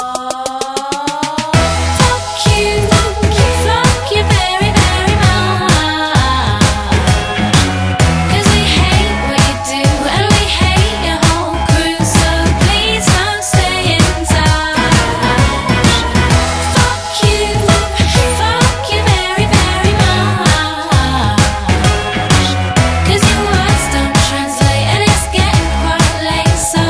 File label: background music